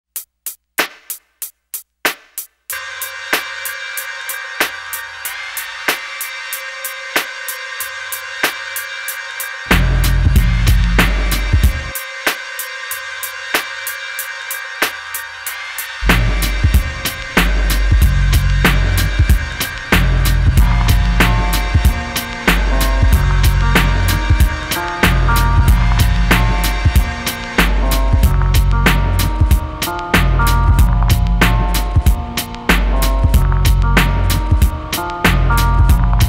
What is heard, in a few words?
instrumental